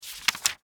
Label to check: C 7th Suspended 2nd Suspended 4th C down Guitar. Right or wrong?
Wrong